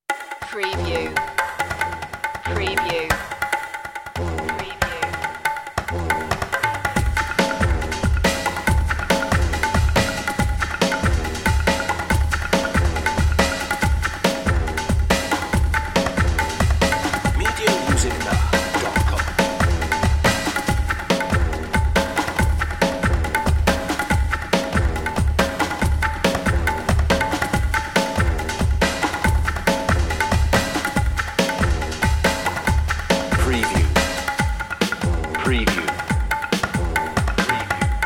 market spices perc 60

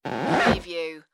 creaky floor

